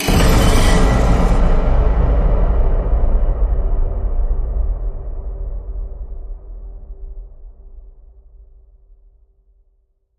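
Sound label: hit horror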